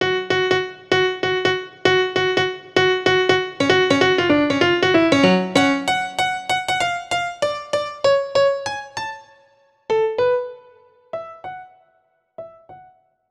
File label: Piano Solo, Cinematic Funk; Sony Creative Software; Urban Tone Poems; Solos